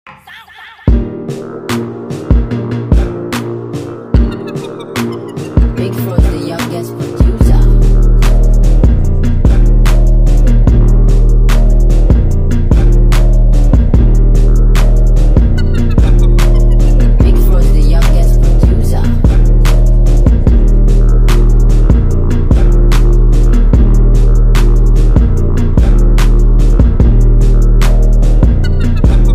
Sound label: Instrumental; Prod by Big Frozz